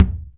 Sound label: Kick, Alesis DM5; drums